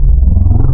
Fem Aah Vox, wavetables; Animoog

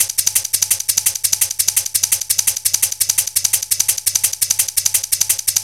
scampering feet, Drum Loops, Drum&Bass, 170; Total Drum&Bass

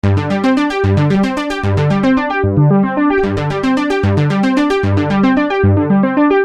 Arpeggio 150 G, Techno Synth Loops; Sonic Foundry (Sony Creative Software); Signals I

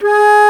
Alto Flute mf G#2; Digital Sound Factory Virtuoso

